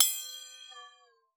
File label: WATER, PERC; Xsample VOL-9